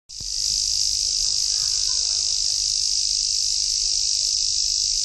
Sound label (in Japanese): ezo zemi, cry of insects